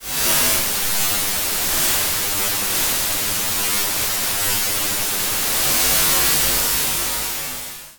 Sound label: Flanger